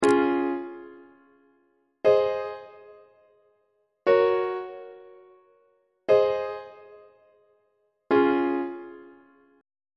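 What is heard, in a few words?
d flat major root position imperfect authentic cadence on treble clef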